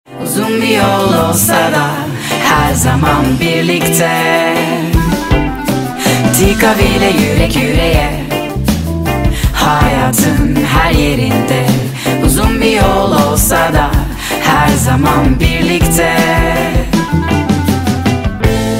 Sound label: jingle